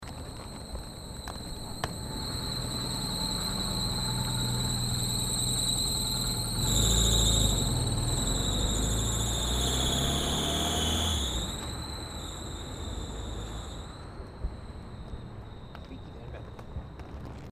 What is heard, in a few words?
car unknown squeaky fanbelt drive off